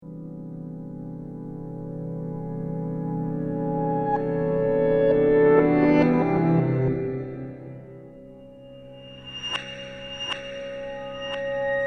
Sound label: Counterclockwise fifths